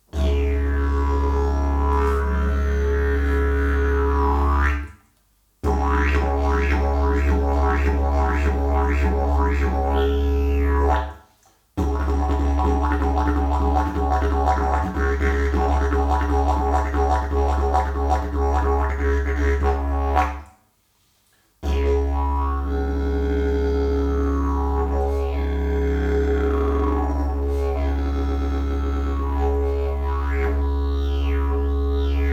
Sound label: didgeridoo, jilm; hadolez